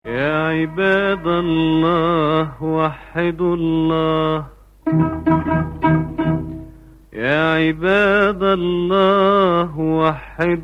ramadan song ringtone

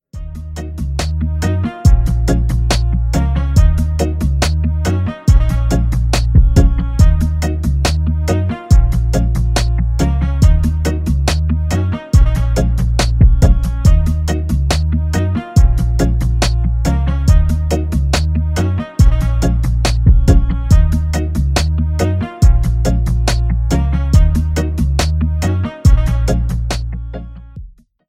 hip hop ringtone; english ringtones

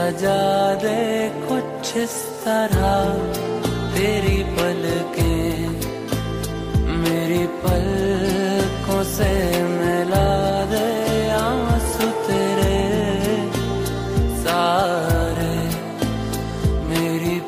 ringtone, bollywood ringtones

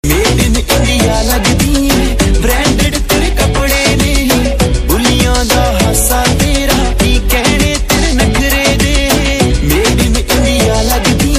romantic hindi ringtone, bollywood

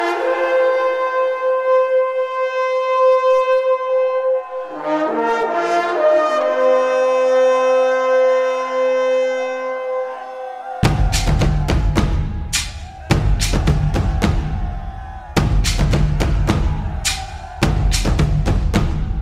music tone ringtone, bollywood

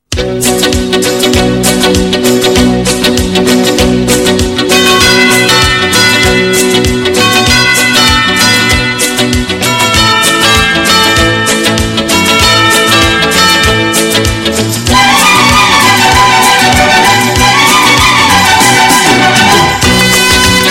indian music ringtone, bollywood ringtones

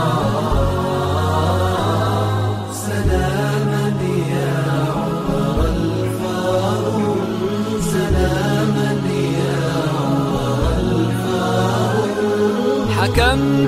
islamic ringtone; islamic ringtones

